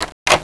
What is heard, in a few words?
locked